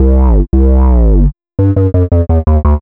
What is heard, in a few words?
DnB Bass 170 C, drumnbass 170bpm; musicradar; Basslines B